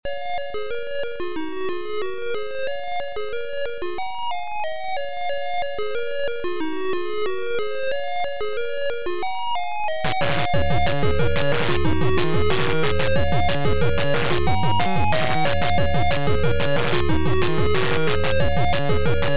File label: Happy Drums